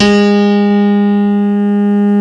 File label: M1 piano